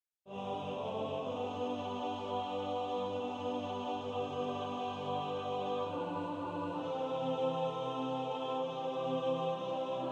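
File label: alto, practice music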